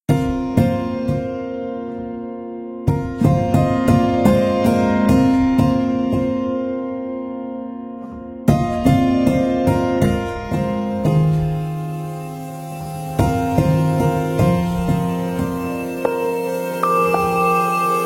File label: Piano; 钢琴; Piano Colors; Komplete